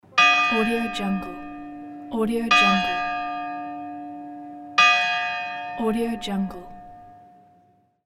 church bell; philipp seitz

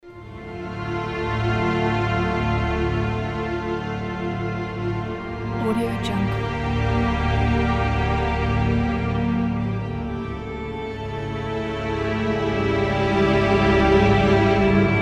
slow sad emotional strings

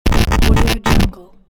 system crash

